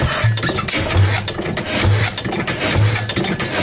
blackstone oil engine loop, 1909 10HP Blackstone Oil Engine; DRUM LOOPS and BREAKS